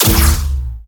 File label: Tesla Shot; Gameplay Wpn